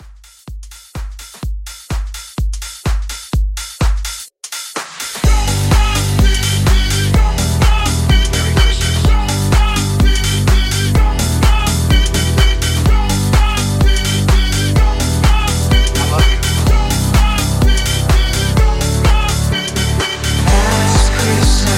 House Mixshow Edit 126 CLEAN

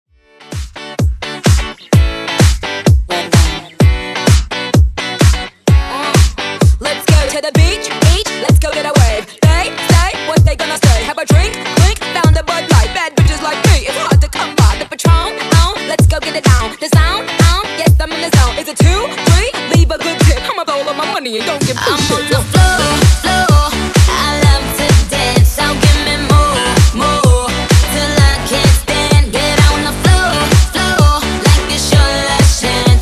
Extended 128Bpm

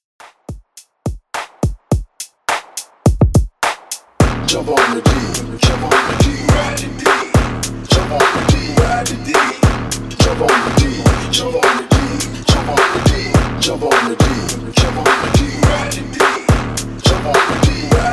105Bpm

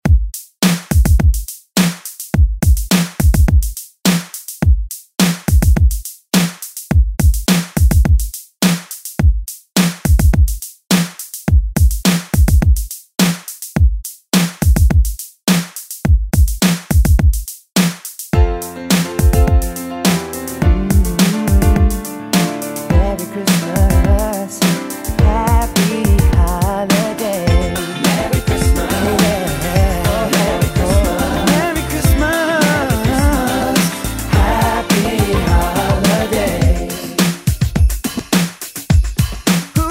remix; Christmas

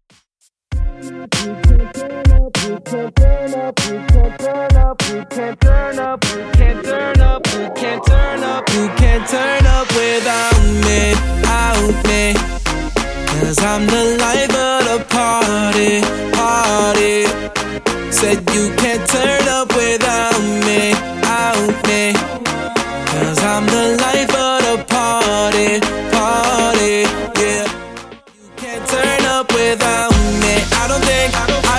2ks DJ Friendly Redrum 98bpm

